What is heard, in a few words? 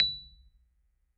B7 MID, Rhodes Mark II; CEPCo Sample Series Vol. 3